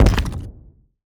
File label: rock burst; battle; CrossCode